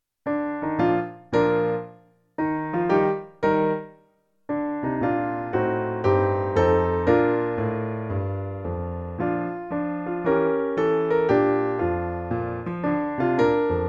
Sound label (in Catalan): piano sol; Himne del CNI